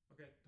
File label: Piano A